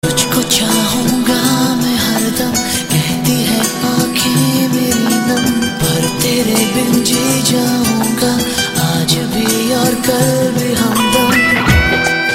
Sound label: sad ringtone